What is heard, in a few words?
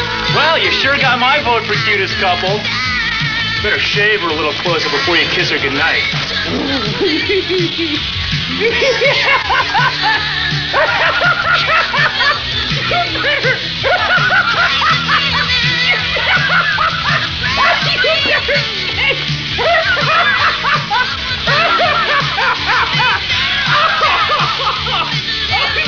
laughing